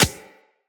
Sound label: Beach Ball Bounce Dirt; Water Fight; Beach Ball Floof